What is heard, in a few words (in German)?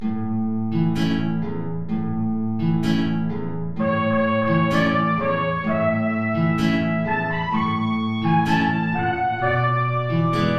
Tango, Trompete in Bb & Gitarre, hoch